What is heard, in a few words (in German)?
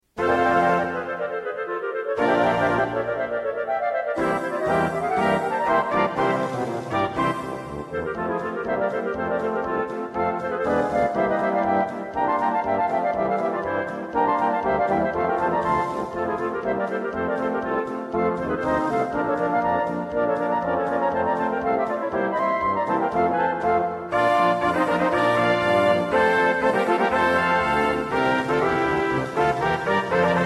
KLARINETE; BUCKEL